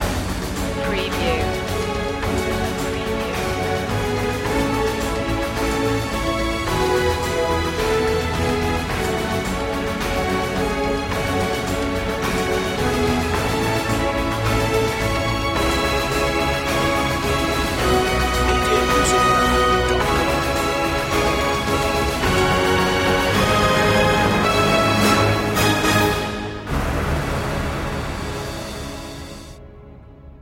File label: no choir